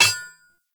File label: Ambient Blacksmith Hammer Impact; Sprout Farmers; Midrath